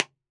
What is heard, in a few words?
Erres tube radio, IR, Speakers and telephones